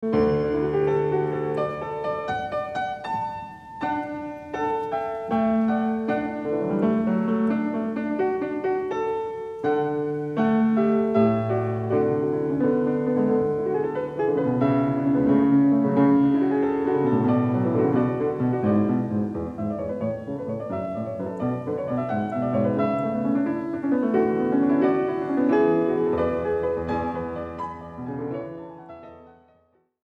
Well Tempered Clavier Book II Prelude & Fugue in D; JS. Bach